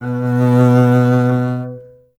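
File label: STR CBS, Solo Instruments, double bass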